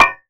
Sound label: Percussive Hit, contact mic